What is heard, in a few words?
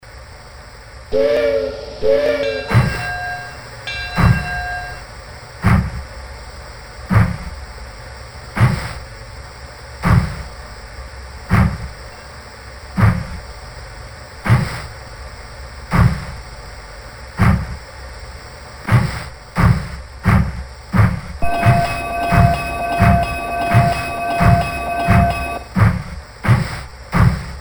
American steam sound kit; analog; piko